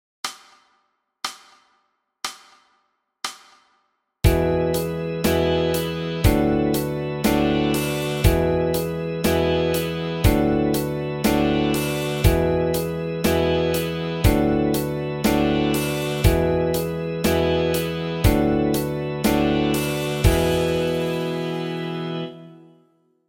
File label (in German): A-Saite